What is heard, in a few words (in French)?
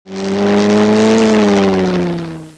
RETOUR